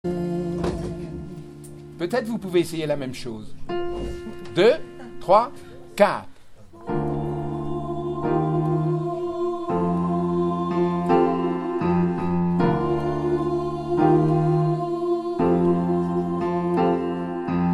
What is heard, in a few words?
Oouh alto